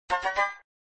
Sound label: Fanfare; flipper